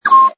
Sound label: Tui peep, Exotic bird - New Zealand bird Tui, single low pitched tweet.; Birds; Animals